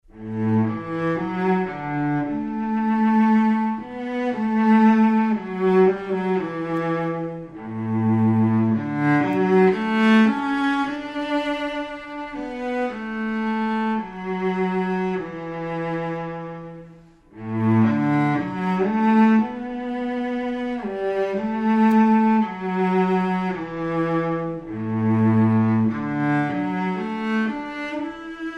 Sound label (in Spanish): Violoncello; honduras musical 7mo grado